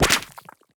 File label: Background Otter attack